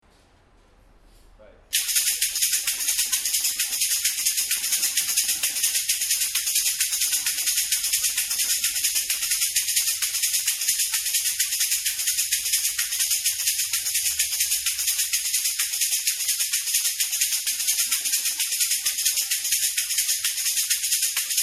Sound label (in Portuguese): chocalho; ivsom